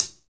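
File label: hi hat; HH-Kits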